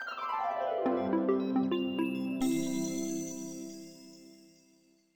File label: Power off cheerful; Samsung SGH-E500